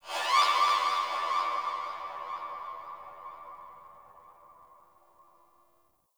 Sentient Harmonic Cries; Warframe; Tau

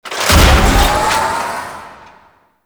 Galleon Turret Enabled; Warframe; Ambience; Grineer Galleon; Vor Galleon Fight

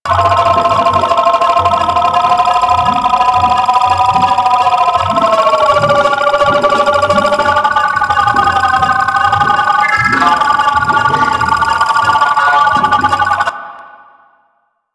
Med Station Loop; Lasrian Med Station; Lasrian Soldiers; Weapons

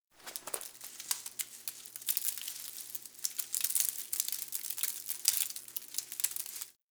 Derelict Leave Drop; Interior; Ambience; Warframe; Orokin Derelict

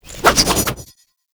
Sword Spin; Warframe; Duviri; Dax; Weapons

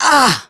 Pain

